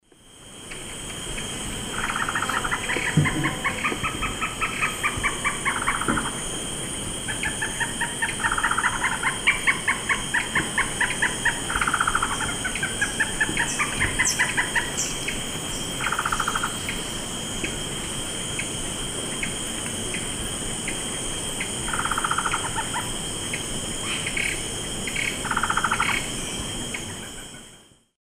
Trogon curucui, calls pair; S. America; Eastern Lowlands

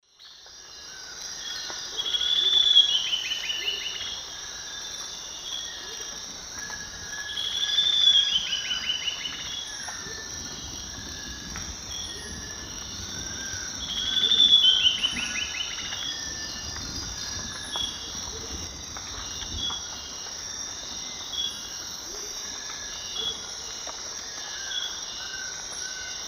Sclerurus caudacutus, song; S. America; Eastern Lowlands

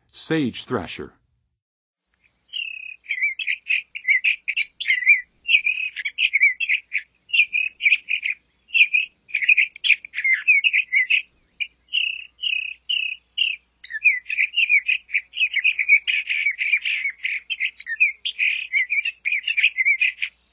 Sage Thrasher, Songs of Birds of North America; Western USA; Western USA Songbirds

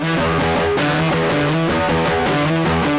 Super Riff Gtr, Rock, Guitars

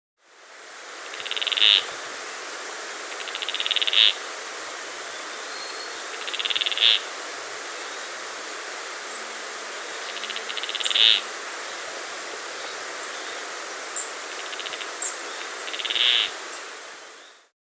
Chestnut-Crowned Cotinga; S. America

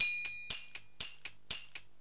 Perc, Percussion Latin

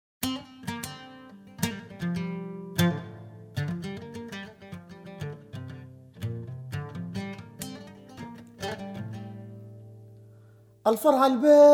Desert Blues World

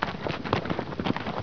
HORSE, animal; 动物

